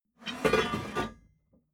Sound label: metal scrape wood soft